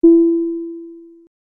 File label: bing